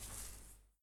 Grass Step Right

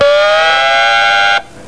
Diving alarm

